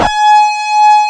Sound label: TURBO D6; East West - Global Sounds; DIST.SOLI